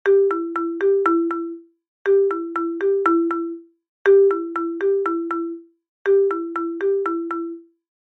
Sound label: Classic Marimba